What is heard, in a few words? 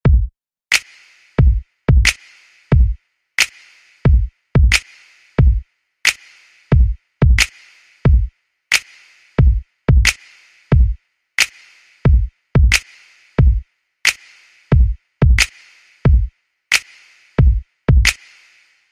Very Hot Beat